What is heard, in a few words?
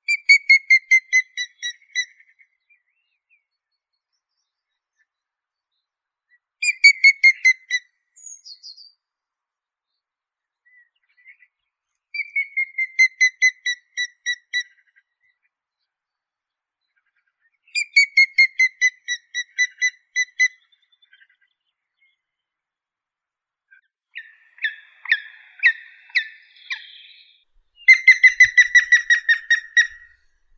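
grey-headed woodpecker, Picus canus; dyatel sedoi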